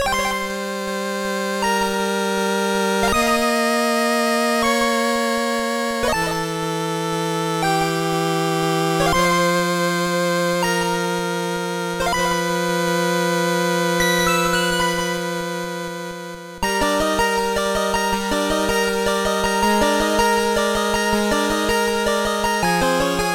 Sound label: 8Bit; BW; Dragonspiral Tower